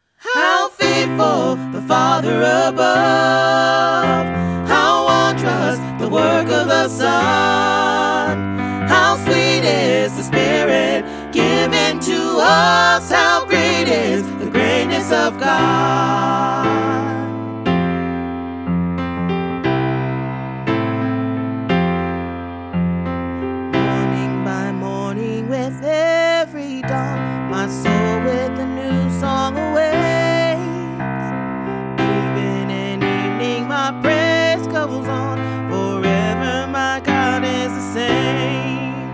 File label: Full Choir, christmas choir